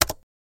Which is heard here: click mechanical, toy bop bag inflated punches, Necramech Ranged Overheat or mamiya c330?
click mechanical